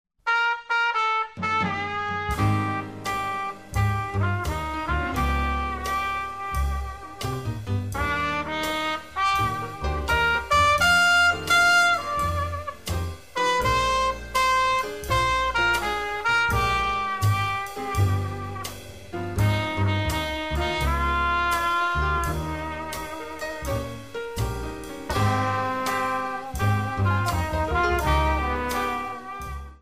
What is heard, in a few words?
dixieland band